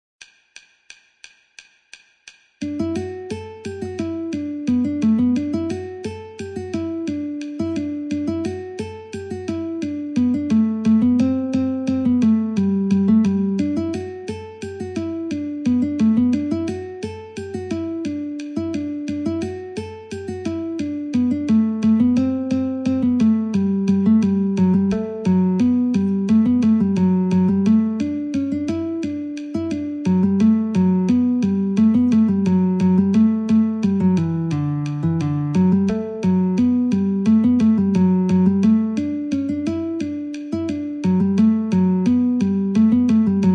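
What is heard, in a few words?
D Guitar metronome 88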